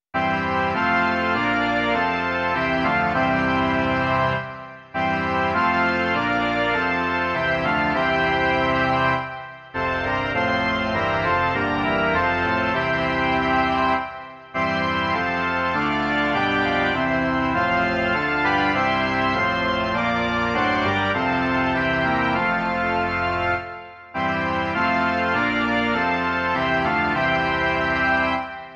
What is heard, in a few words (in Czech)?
varhany; Ó sešli pravdu svou